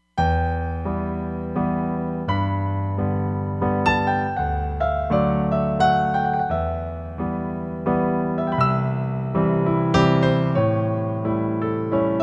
Concert Piano, Roland Fantom XR

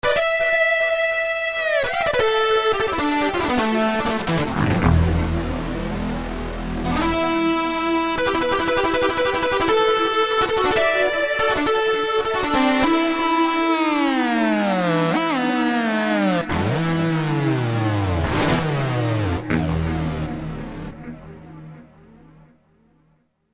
Big Wet Lead Gtr; Kurzweil K2000 V3

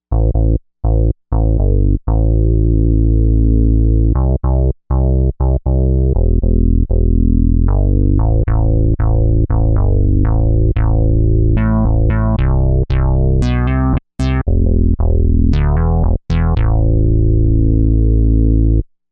Softena Bass; Novation UltraNova; SuperNova Soundpack Volume 1

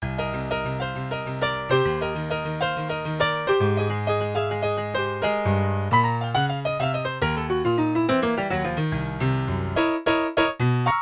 Jazz Tune Sequenz; Yamaha TX16W; Jazz Performance; Jazz Performance 2

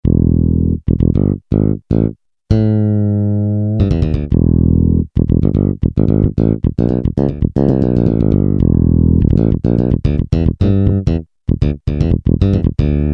Jazz Bass; Roland L-CDX-01 Rhythm Section Instruments

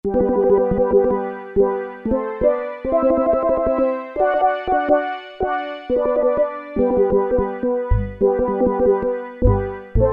Steel Drums, Roland Juno-106; Factory Preset

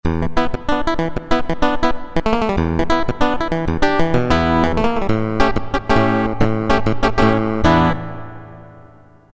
Clavi, Roland MT-32

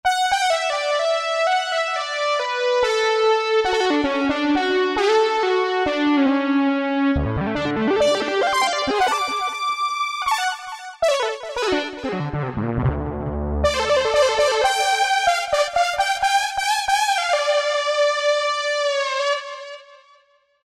Screamer, Korg Wavestation A-D

